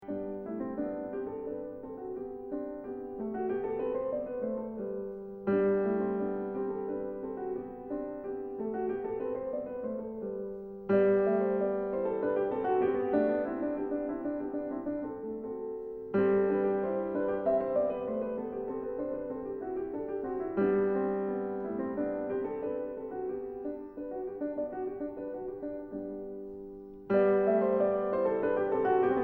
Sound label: English suite in G minor gavotte; bach; bwv 808